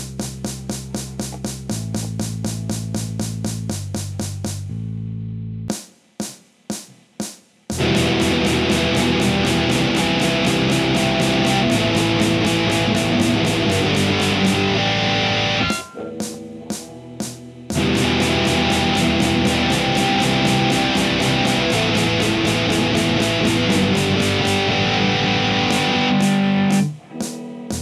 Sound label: tape deck